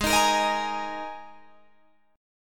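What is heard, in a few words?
Ab Suspended 2nd chord, Guitar, Open G tuning; down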